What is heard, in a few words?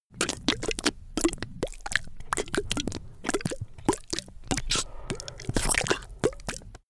monster flesh eating; comedy-cartoon; soundpool